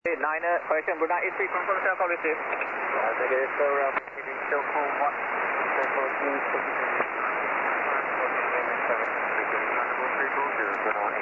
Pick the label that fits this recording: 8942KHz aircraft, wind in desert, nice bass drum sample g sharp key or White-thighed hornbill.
8942KHz aircraft